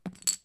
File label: chips Handle, Casino; Kenney's Sound Pack